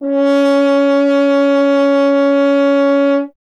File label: F. Horn; Miroslav Vitous; Solo Instruments VOL-2